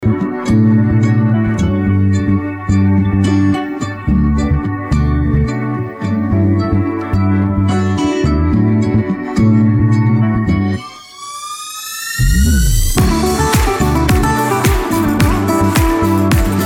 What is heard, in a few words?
Karaoke Song